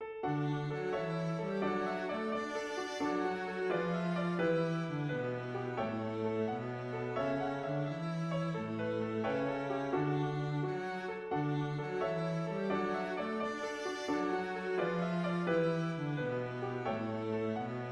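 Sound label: melody viola cello; The New Stove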